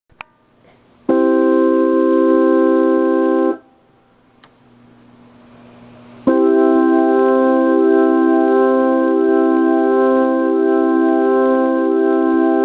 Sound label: Leslie, organ